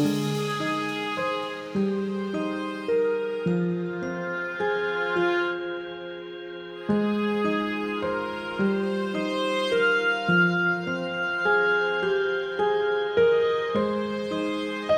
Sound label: Brass Solo; Solos